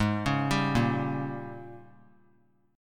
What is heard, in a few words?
Ab Major 7th Suspended 2nd Suspended 4th Ab, down; arpeggiated; JGuitar